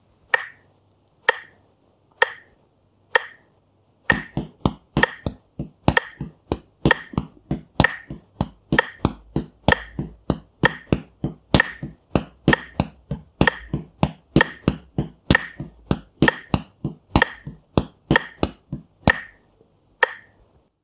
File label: cutting beat into 3 parts